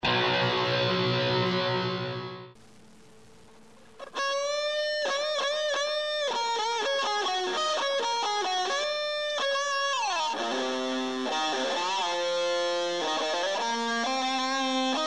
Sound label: beaty beat; HEEP 2009 Student Projects